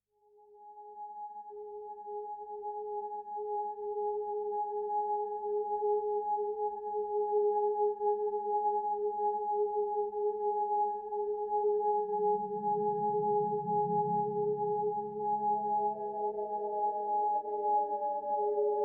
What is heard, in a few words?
Spooky Drone; Nordic Nights Drones